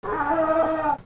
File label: ANIMALS